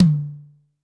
D3 High Tom, Yamaha PSR-E323 Standard Kit, drum machine